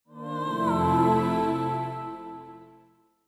Logoff; gamerip; Samsung theme for Windows XP

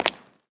concrete wet footstep; adams venture origins

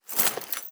warrior walk foley

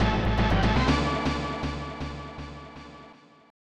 So Long (Win); dorque and imp 1994 snes

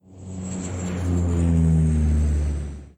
Takeoff; Pocket Planes; android

